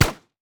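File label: turret basic attack; LoL; heimerdinger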